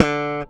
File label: Slap One Shot D, Bass; Bass X